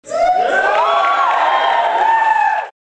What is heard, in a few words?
crowd excited